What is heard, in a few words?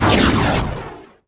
THUNDER